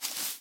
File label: Double Jump